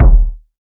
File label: low tone bass drum single shot d sharp key; Duration 0:01; Samplerate 44100 Hz